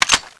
clip in; tfa cso

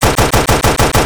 falcon

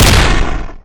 spas12 fire

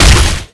damage

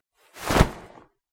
coat unequip; clothing system

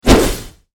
swing sword charge

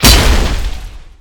weapon impact heavy

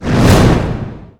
whoosh heavy

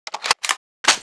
gun reload; alyxgun

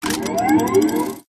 gunstand machinegun rotor start; gow; turret judgement

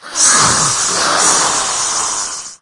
idle, maggot; doom3

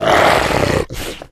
attack hit, bloodsucker

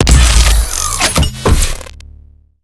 gauss fire; doom gauss

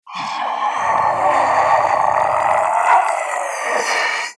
idle, zomb female; nmr

